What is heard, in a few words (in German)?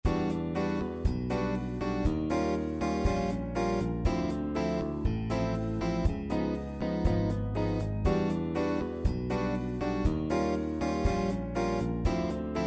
Bandpattern Bossa; Popularmusik